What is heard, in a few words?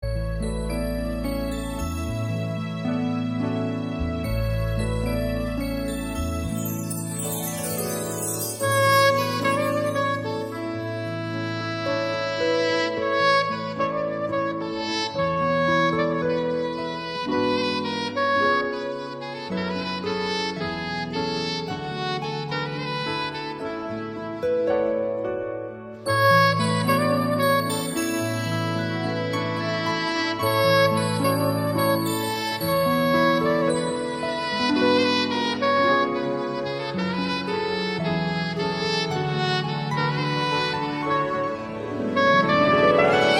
piano